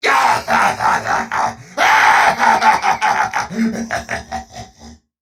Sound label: Laugh; Warframe; Grineer Chem Nox; Battle Barks